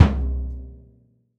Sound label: bassdrum oh, gretsch kit